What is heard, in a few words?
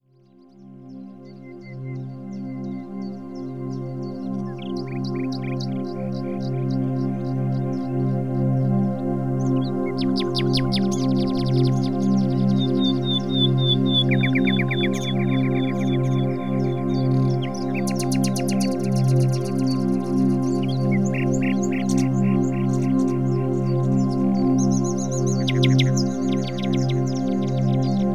ambient birds; polygoniix Intro Essentials pt1; Ambiance